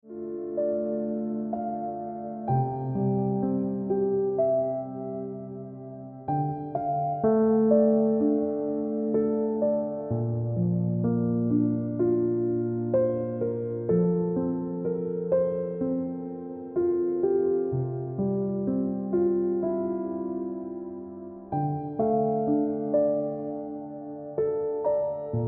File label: Ringtone